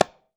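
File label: Conga Slap; Roland BK-5; Percussion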